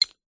food savory snack wasabi pea single drop in ceramic bowl; zapsplat